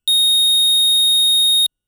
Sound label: Square A#7, keys; fleet keys Samples